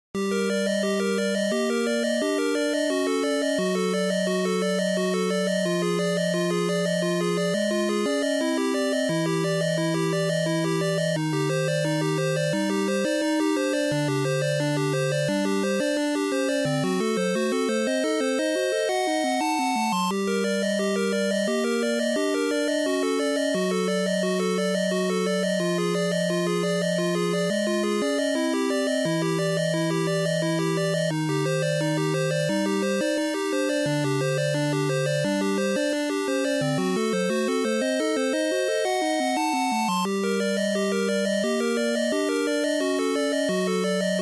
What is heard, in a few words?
dungeon